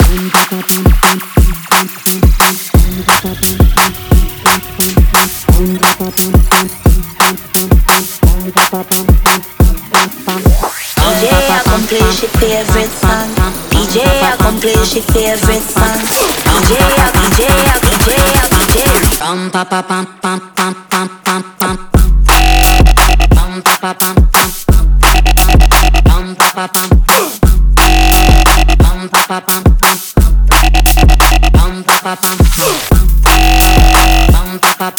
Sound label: Drum and Bass